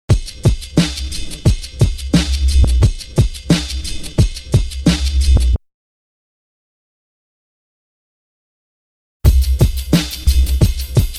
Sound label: Drum loop; BEST OF DRUM BREAKS 30